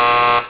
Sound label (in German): Standartton Warnsignal; Win-Sounds